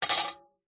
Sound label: warfare sword small set down on hard surface